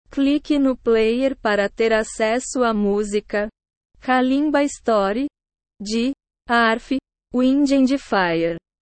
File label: kalimba